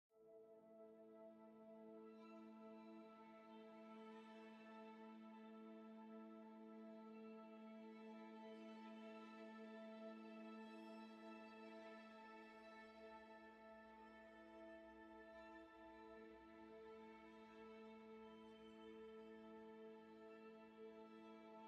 tonal Amb String A

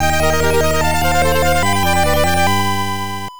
Important Item Get; Emerald Dragon; PC Engine; Super CD-ROM2

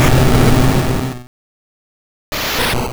Explosion; Pokemon; Gen 1; attack moves; RBY

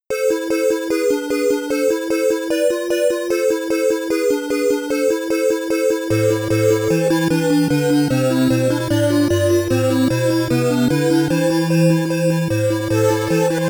Story Sequence; Batman Returns; amiga

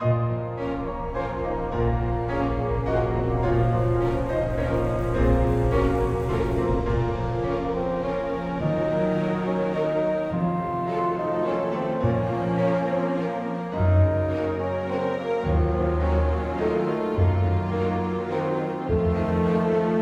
Waltz; Dark Parables 05 The Final Cinderella

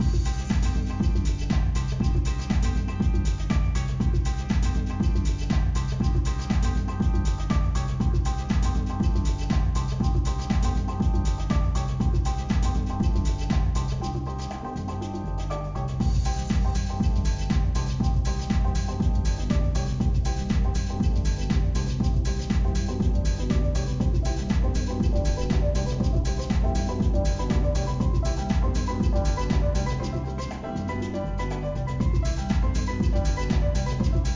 Club Music

